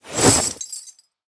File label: Coin Bag Underlay Attack